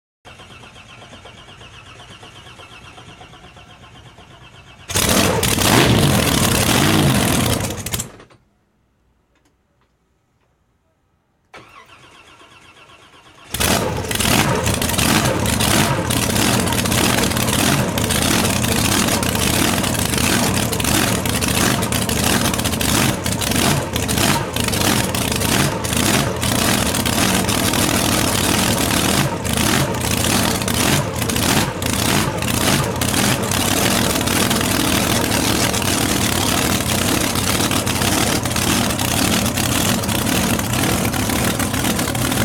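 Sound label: Drag Boat False Start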